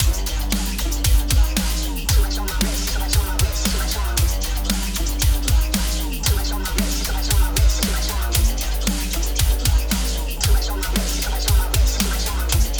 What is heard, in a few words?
Modern Heavy Nu-Metal